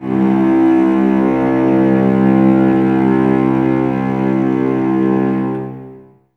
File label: String Ensembles Cellos C 2; Miroslav Vitous; 90 s Sample CDs